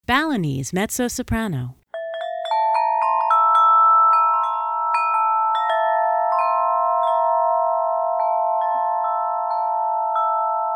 Balinese Mezzo Soprano

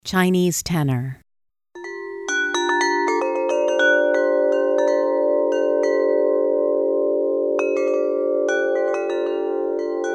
Chinese Tenor

